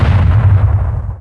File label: stomp